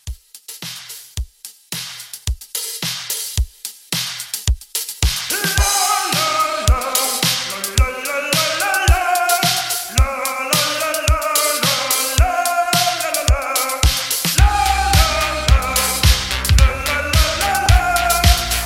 80s Italo Disco Re Drum 109

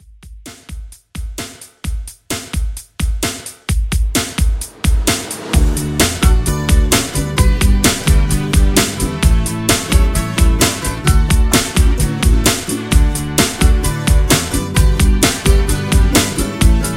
Christmas Re Drum 130

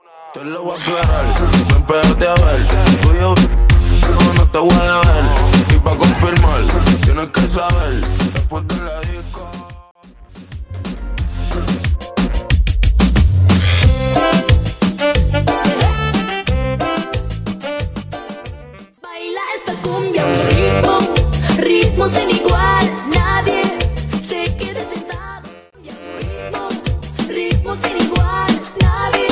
Latin Reggaeton Hybrid Mix

